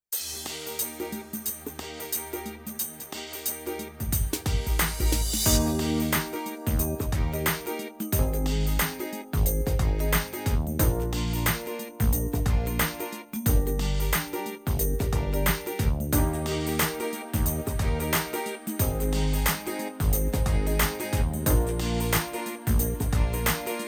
Urban Funk

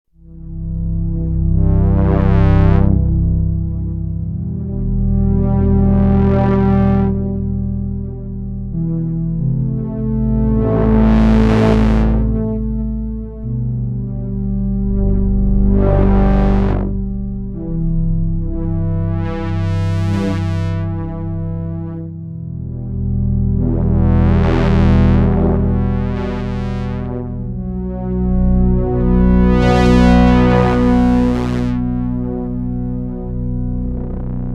behringer ubxa polypressure

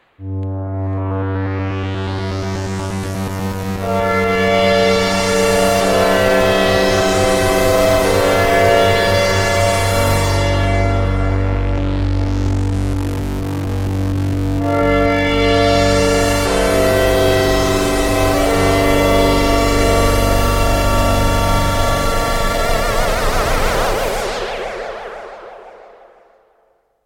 SFX Hyper Atmo

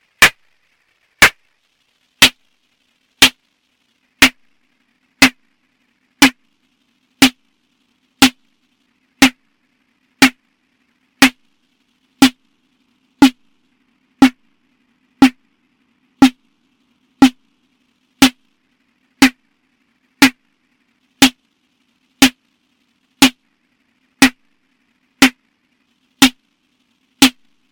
Moogerfooger Drum Snare Ring Mix

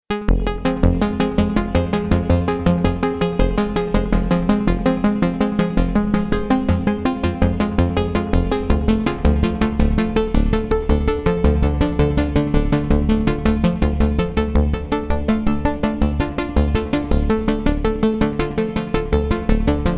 Waldorf Quantum

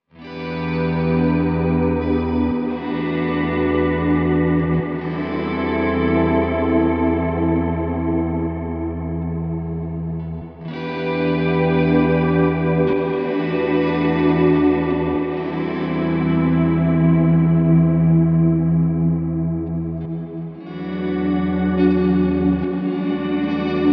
Reverb Swell

